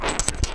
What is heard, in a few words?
Marble, fieldrec